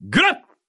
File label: attack